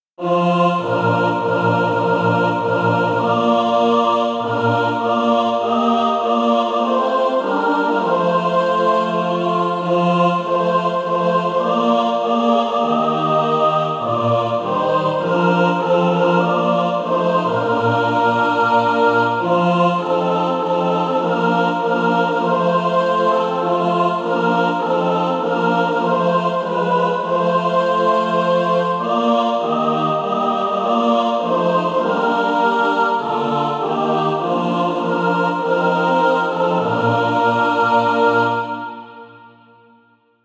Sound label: mixed; SATB